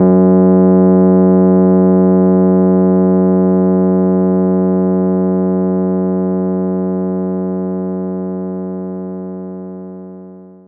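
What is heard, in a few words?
Rhodes F#1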